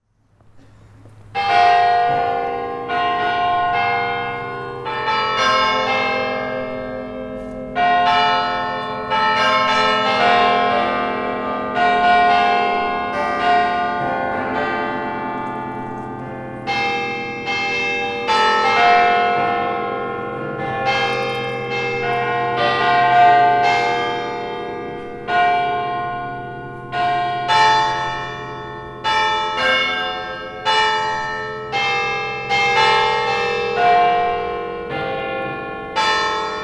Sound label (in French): carillon; Sursee